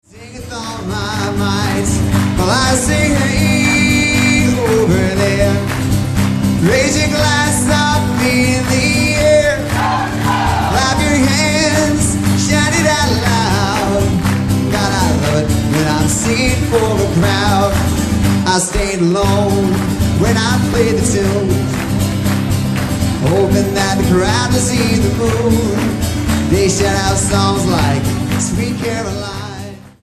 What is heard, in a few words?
singing for a crowd